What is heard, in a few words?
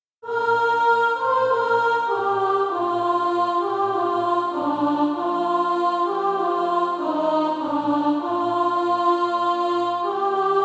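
soprano, SATB Practise Music; Dwelling in Beulah Land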